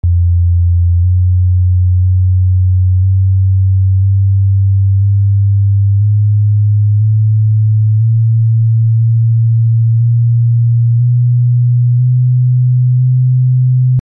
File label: Basso soli range 3 mixed ascendant scales 157+138+126 cents